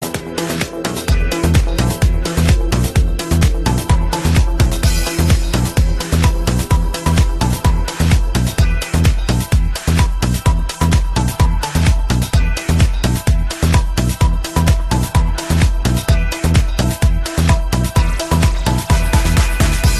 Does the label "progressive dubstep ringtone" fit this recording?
Yes